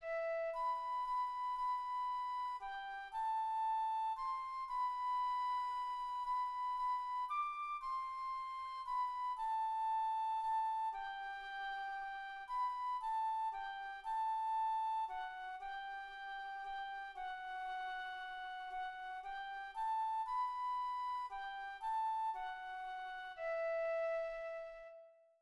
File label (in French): FLUTE; ALLELUIA de Schutz